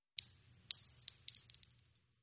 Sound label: Shell Case Sngle Tube, shell casing movement